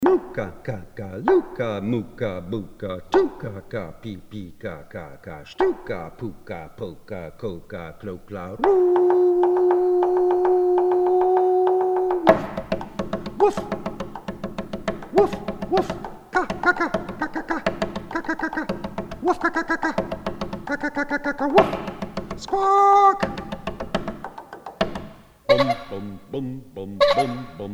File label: whoof